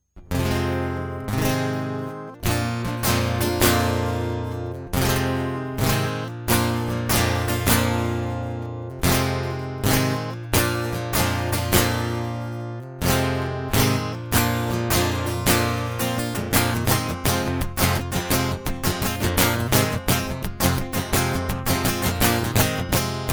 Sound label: Guitar Loop